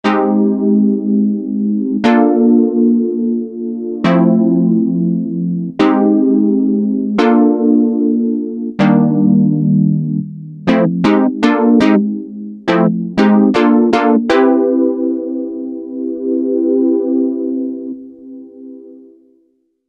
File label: SX240 CHORD MEM